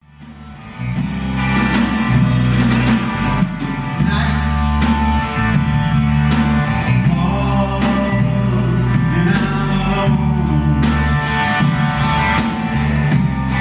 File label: Live Song Samples